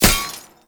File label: Medic Drone Hit Notification; Abilities; Warframe; Tau; Dax Medic